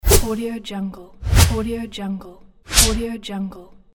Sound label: short whoosh